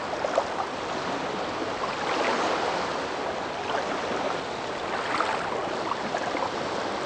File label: wave; Mafie 1